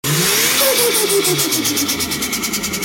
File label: Diesel Turbo